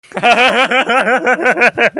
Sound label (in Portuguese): risada